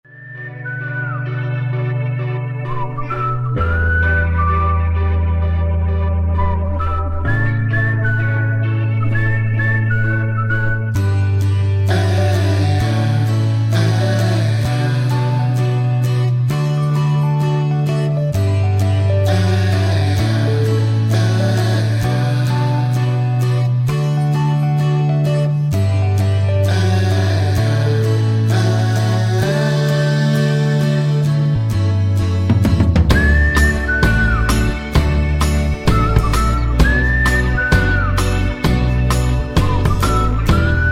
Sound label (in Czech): melodie